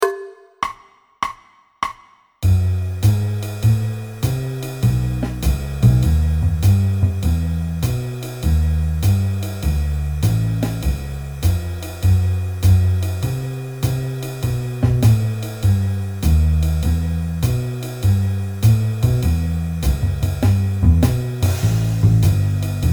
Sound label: piano; musiklinjen; provspelning